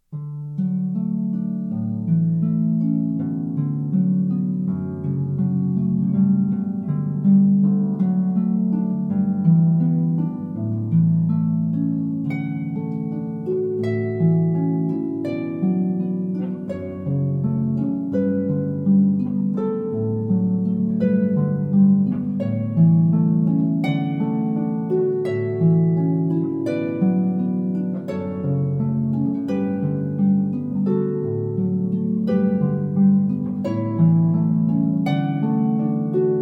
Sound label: pachelbel canon solo harp